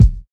raw kick single shot a key, Royality free kick drum one shot tuned to the A note; kick drum samples